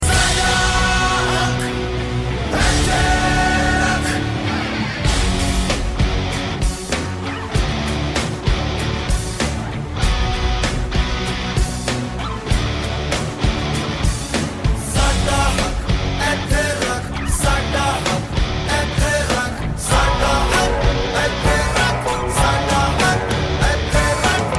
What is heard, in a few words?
guitar; electronic